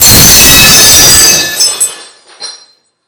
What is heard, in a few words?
Heavy Glass Break